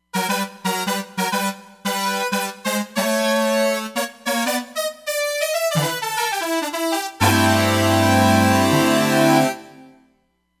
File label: Brash, Roland Fantom XR